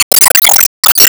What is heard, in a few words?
Enemy Iceberg Active Both Arms Motion